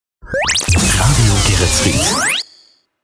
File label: Jingles Shotgun; Radio Geretsried